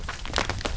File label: rocks; Tanks